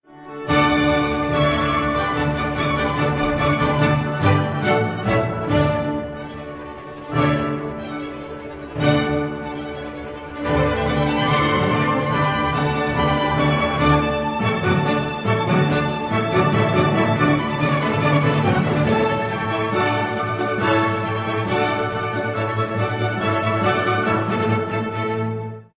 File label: classical